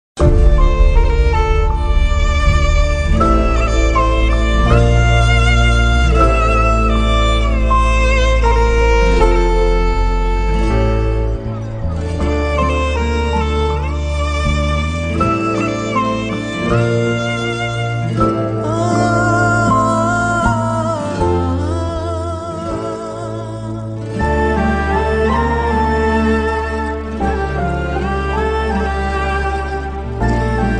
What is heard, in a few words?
best emotional bgm ringtone; annatha